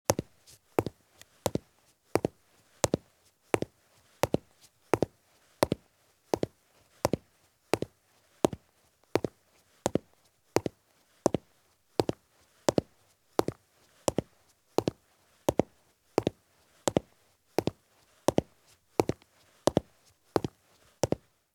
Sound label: Heavy Work Boots Walk at Fast Pace on Concrete; Sound Ideas; Footstep Sound Effects